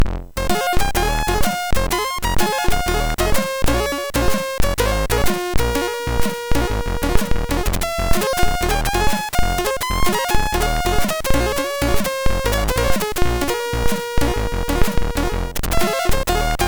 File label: Rag sid MOS6581R3